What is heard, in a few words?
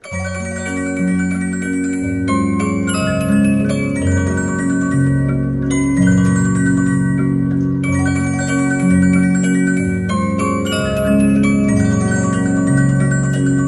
Gentle Waltz; Jolly